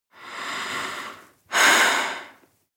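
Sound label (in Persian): نفس کشیدن, صدای نفس عمیق زن, human breath female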